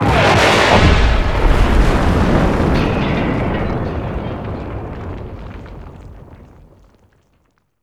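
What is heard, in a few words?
Explosion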